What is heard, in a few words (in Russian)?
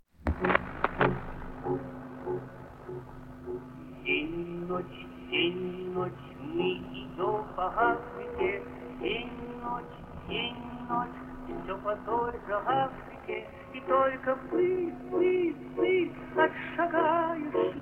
Песня французского солдата